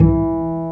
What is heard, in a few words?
Bass, Roland; Club-50 - Foundations Roland; Ac Bass